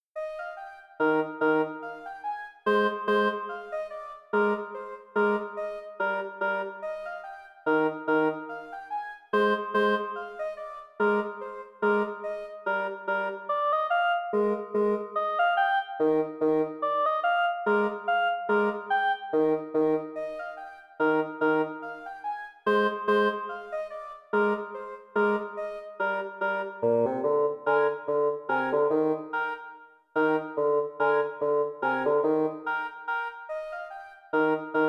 on a carousel

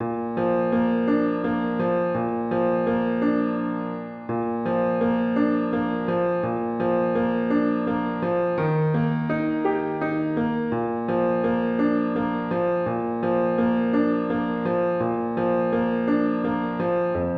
o holy night piano

